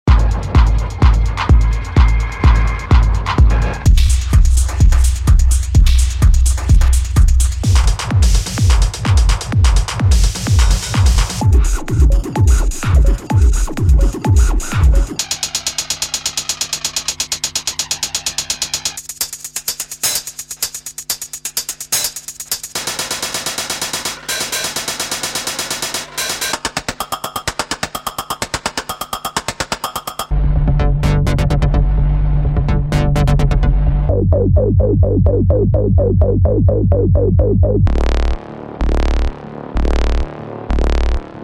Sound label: leftfield techno; leftfield techno 2